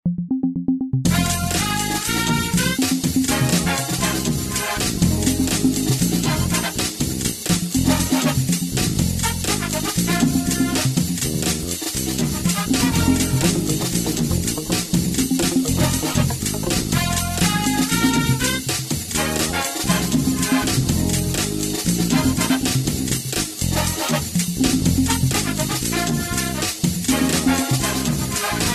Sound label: Funq Brass Mix